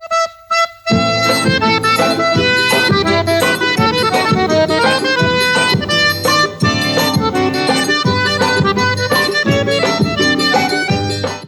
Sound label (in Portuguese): MUSICA FORRO INICIO